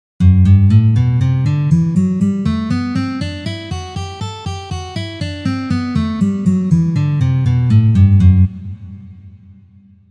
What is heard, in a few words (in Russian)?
Gamma Mi minor naturalnyj vid pozitsiya